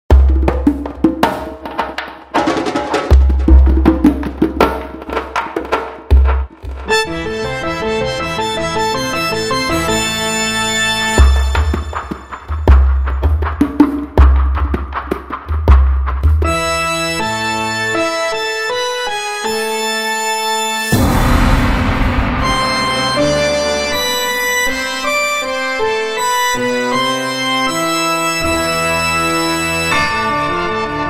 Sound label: Fanfare; Hamburg 2024